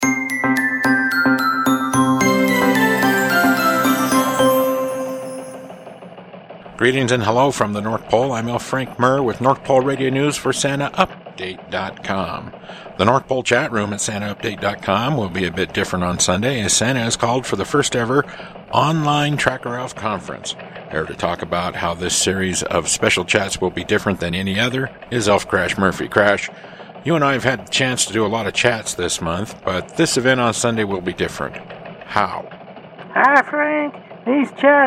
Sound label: elf choir